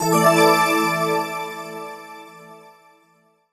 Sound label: Jingle Item Get; Ape Quest; PSP